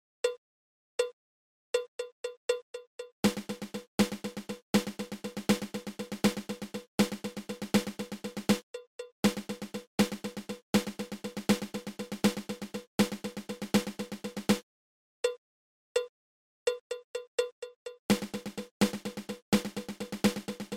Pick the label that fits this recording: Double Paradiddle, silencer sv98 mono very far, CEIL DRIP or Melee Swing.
Double Paradiddle